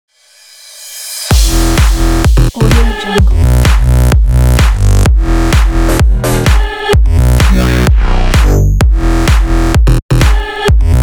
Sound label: bass dance